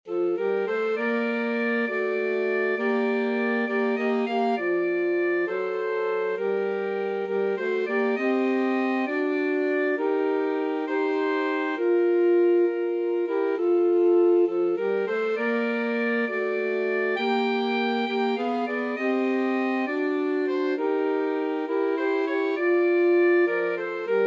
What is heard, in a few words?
alto sax trio